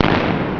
Fire Rock; QuadMire 1.0; Quagmire

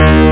rythm brass

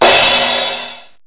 cymbal crash

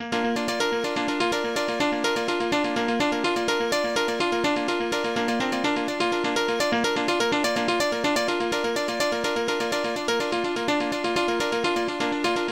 piano mess; OctaMED SoundStudio V1; mug-members

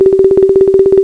fill er up; fillerup old; Ferazel's Wand Demo

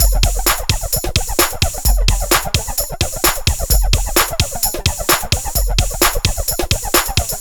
Cosmic Loop kick snare; ACID Music; CyclotronicResonator

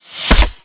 launch; The Sum of All Fears

